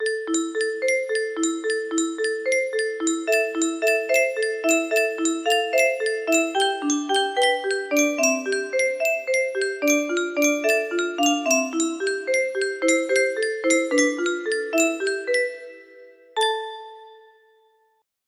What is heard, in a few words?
piano solo, music box; corpse bride